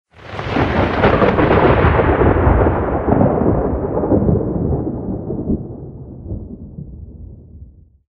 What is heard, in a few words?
rolling thunder; Source Engine; tf; lair